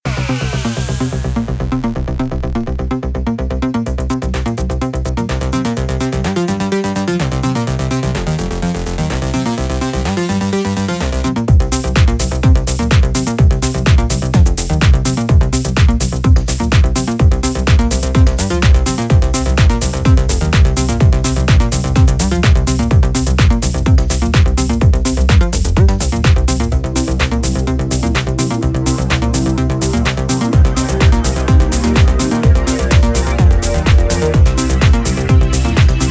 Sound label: music; falling dash